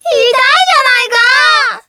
section fail; summer pockets (pink)

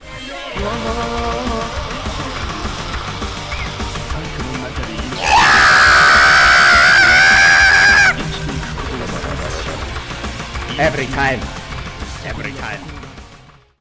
applause; McK v1 trail

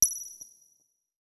menuclick

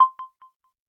spinner bonus; 【Midnight 3.5 Red】- (iSweat)

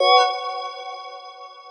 spinner bonus; Charlotte v2 for dt

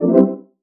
menu back; #-K- Elaina [Less Animation]

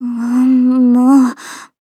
section fail

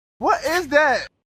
applause; Aleph DT

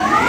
spinner spin; prinz eugen 1.1

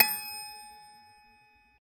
pause continue click; SPLOOSH ( + )

